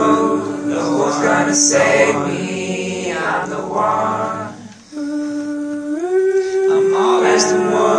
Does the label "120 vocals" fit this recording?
Yes